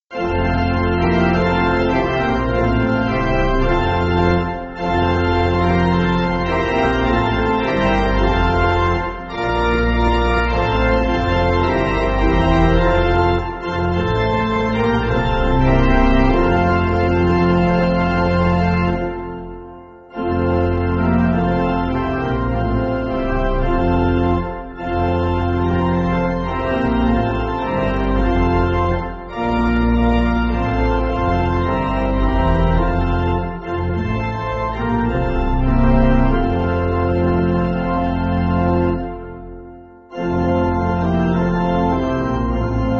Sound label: Pipe, church music